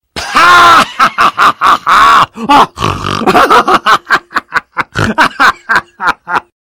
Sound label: laugh earrape; spy